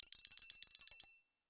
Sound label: Count Prize long; ArmyRaid; Interface and Item Sounds; Items & Collectables